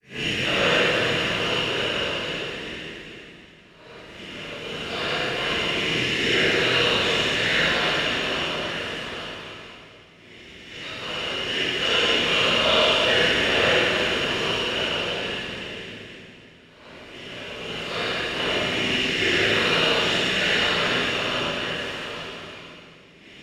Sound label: Dark Prayer